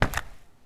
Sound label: Footsteps Concrete Asphalt Street